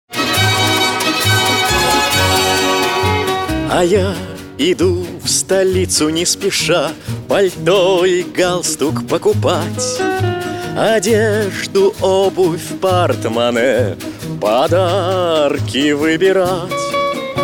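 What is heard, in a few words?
vocal Russian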